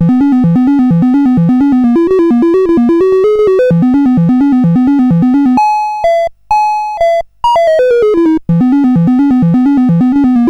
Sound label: sid, CSG8580R5; Bouncer